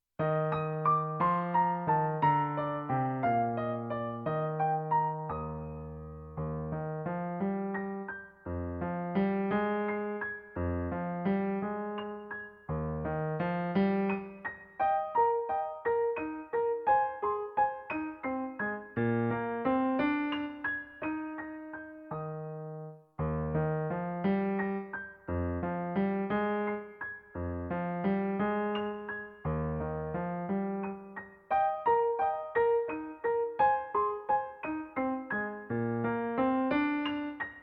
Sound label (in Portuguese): Pobre barqueiro PIANO; 15 canções capixabas